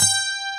AC GTR, JV 1080; Pro-Rec - Synthsonic

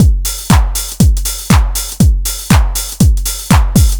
DRM, House loops 120; Best Service ProSamples vol.10 - House; AKAI

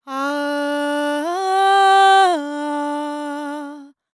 World Voices, INDIA; ILIO; Vocal Planet

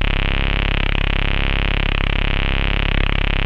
BASS C2, Jungle; Explosive Jungle; USB Soundscan vol.05; AKAI

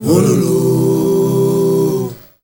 MALE GRP, M. CHOIRS, Heart of Africa; Spectrasonics; Heart of Africa 2

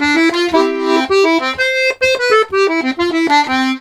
120 GIGUE, Complete Accordions; USB Soundscan vol.40; AKAI

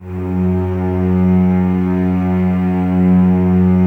Cellos F#2, String Ensembles, No Vib; Miroslav Vitous

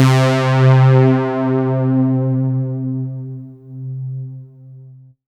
C4, Synth Line; 90 s Sample CDs; NiceBeats; SynthLine VOL-23

